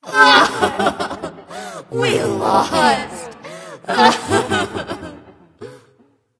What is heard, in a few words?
boo hoo; smite; jingwei